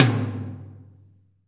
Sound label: TOM TOM, Roland